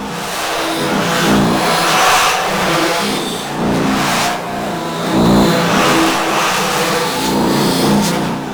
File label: Gravity Stream Loop; Sentient Lair